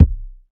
round kick sound C key

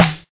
clear steel snare drum sound e key

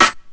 2000s sharp rap snare single hit a key

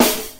90s jazz acoustic snare sound g sharp key; snare drum samples

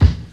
old school clear rock steel kick drum F key

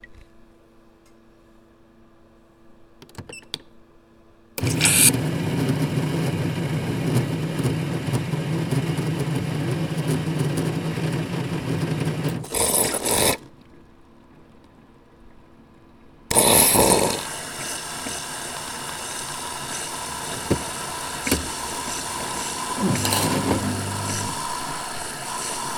espresso machine making a cappucino

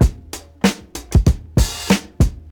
96 bpm 00s drum groove B key; drum loops

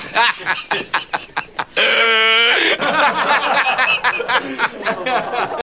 laughing and reaching for air

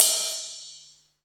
ambient ride single shot a sharp key

